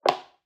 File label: footfall heel; soundscrate